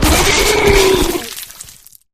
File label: flesh destroyed large; flood